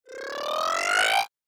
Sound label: Line graph rising animation infographics